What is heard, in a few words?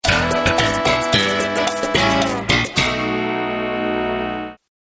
sitcom transition bass riff; seinfeld